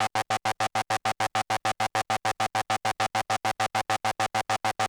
VCS PULSE, Analog SEQ; AMG; Global Trance Mission VOL-1

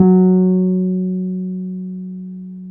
PNO, Wurly

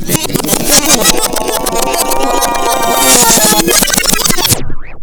FX REVERSE R; Big Beat Samples & More; AKAI; OTHERFX KIT

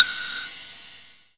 percussion, Dance Synths; Mad Kit

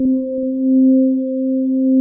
GLOWY ORGS, pads; Pro-Rec - Synthsonic

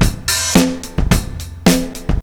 The Lost Beat 108 bpm, breaks; Zero-G Beneath the Planet of the Breaks

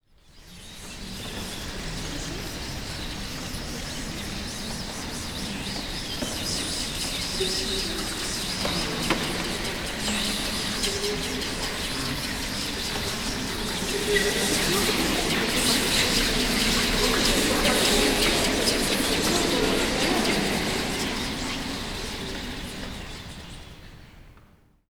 CHATTER

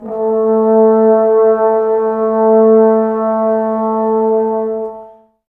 Brass, Woodwind Brass Ensemble

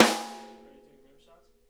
rim f3 R, snare; Sonic Reality; Interactive Drum Kits

